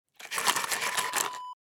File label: candy box shake